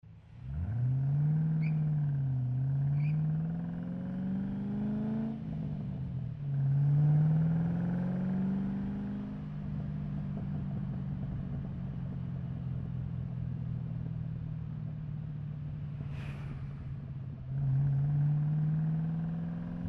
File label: mustang exhaust